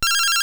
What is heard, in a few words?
Phone, notifications; P3D-Legacy